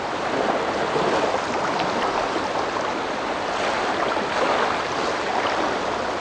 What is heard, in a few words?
waves; Mafie 1